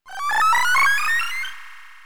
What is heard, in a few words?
battle replenish; SNES; SMRPG